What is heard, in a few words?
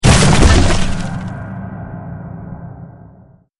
Piano Crash Alert